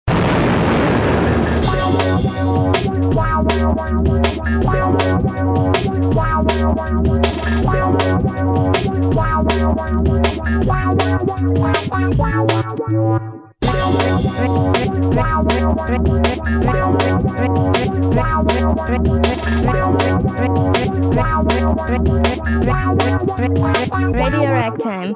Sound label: drum, jingle